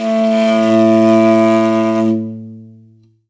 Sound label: L, morin khuur, World Instruments